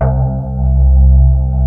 STRIA, Twisted Textures; STRYNGI

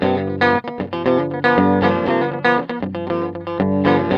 TELE 7TH G#2, guitar; Spectrasonics; Hans Zimmer Guitars VOL-1; TELE7THS

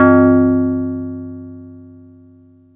ANALOG BELL; Zero-G Ambient 1; ANABELL PERC

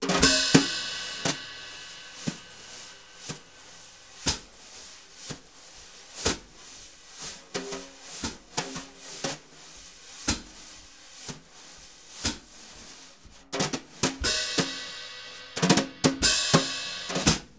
BRU, BRUSH; Univers Sons; Jazzistic

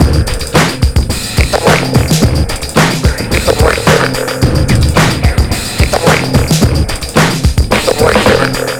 DRM, Breakbeat; Best Service ProSamples vol.24; AKAI

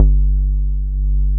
BAS G1, 2 Step; USB Soundscan vol.51; House Side Of 2 Step; AKAI; BASS

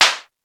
CRACK, TR-808; Greytsounds VOL-1

